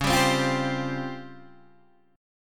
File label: C sharp Major 7th Flat 5th Guitar DADGAD